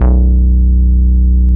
STREET BASS, Bass Analog & Synth; USB Soundscan vol.12; AKAI

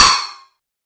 CERAMIC SNARE

